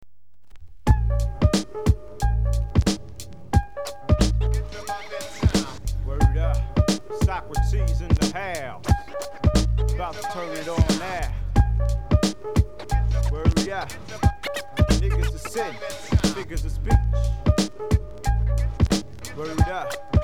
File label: hiphop